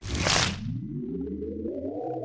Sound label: Hover Start; Skull Kid; Zelda; MM